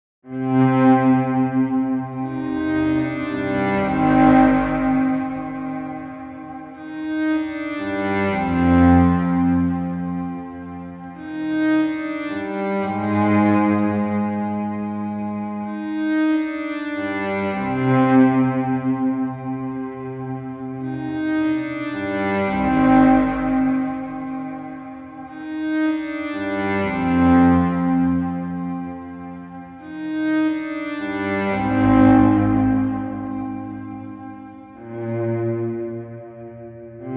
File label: CELLO D